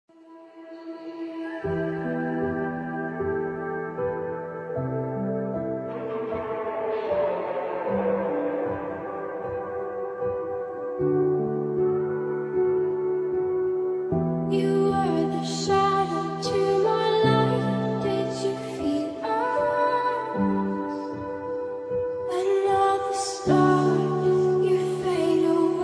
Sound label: slowed reverb mashup chill music